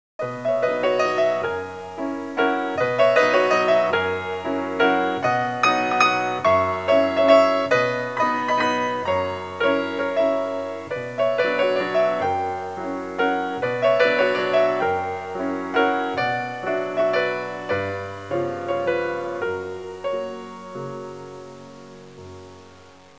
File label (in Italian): suonerie classica; musica classical